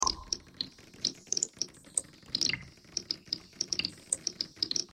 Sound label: bagpipe thread harmonic